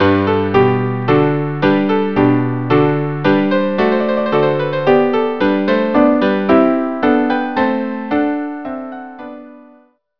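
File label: minuet string quartet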